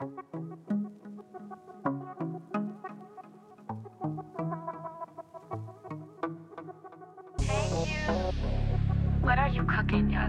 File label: Type Beat